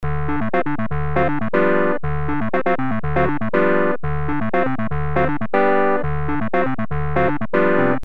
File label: Clavi Synth; Zephyrus; Syntheway